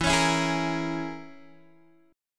E 6th Flat 5th E down Guitar DADGAD

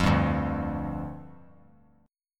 D Minor Double Flat 5th, down; Dmbb5; strummed; JGuitar

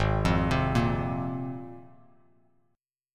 G sharp 6th Add 9th G sharp Bass Standard; arpeggiated; JGuitar

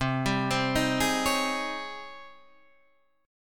G sharp Major 11th C

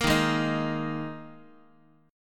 Eb Suspended 4th, Guitar; Ebsus4 strummed; x,x,1,1,0,2; JGuitar

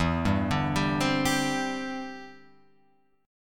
E Minor 7th Sharp 5th, down, Guitar, Open F; arpeggiated

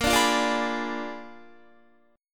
Bb 7th Flat 9th Bb down Guitar; Bb7b9; strummed; JGuitar

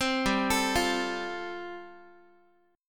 G 7th Suspended 2nd Suspended 4th G chord, Guitar; G7sus2sus4 arpeggiated

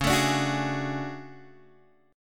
D Major Sharp 11th C sharp, chord

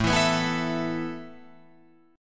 A Suspended 2nd A down Guitar Open F; Asus2 strummed

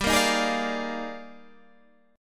Eb Major 11th G, guitar

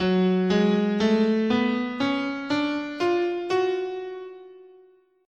Gb Harmonic Minor, scale

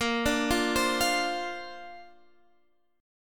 Bb Major Add 9th, Bb, down, Guitar, Standard; arpeggiated; JGuitar

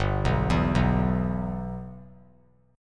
G 6th; G6 arpeggiated

